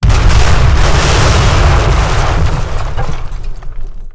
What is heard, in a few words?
explosion shingle